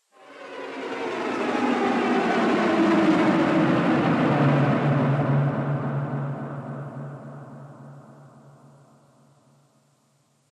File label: Combat String Start